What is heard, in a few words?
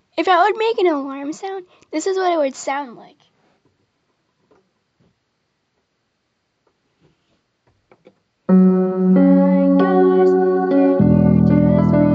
Keyboard Ringtone